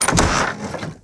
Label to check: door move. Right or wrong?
Right